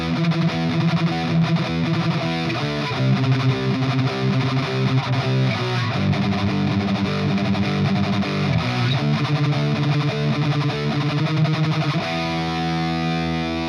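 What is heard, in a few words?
EL84, guitar; GR56 Tung-Sol LEED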